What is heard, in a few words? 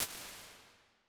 plate bright mit ER, Plate Reverbs; Convolution; Kontakt 5